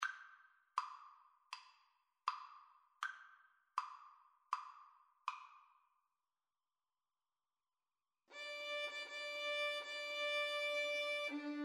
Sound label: Violin-Viola Duet